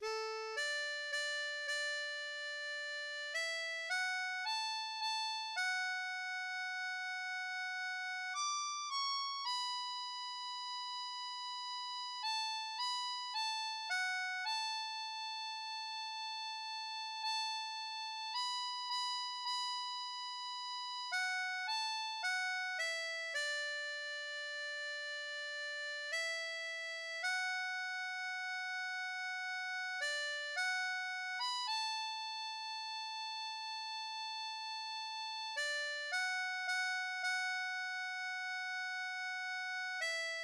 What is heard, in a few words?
Shenandoah, harmonica; Diatonique